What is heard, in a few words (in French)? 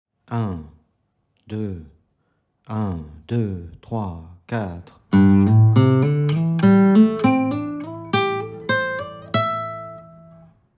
exercice 100bpm